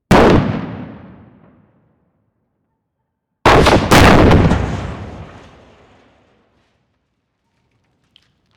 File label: Rocket Car Explosion